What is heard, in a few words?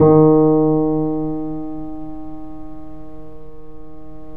The Piano, D#